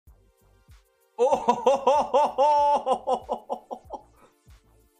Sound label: hoho